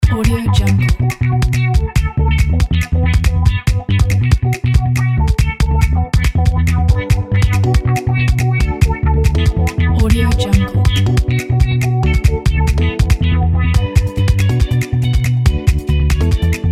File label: guitar background